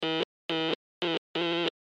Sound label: Morse Code Q Letter; Amateur Ham Radio; Technology; Blastwave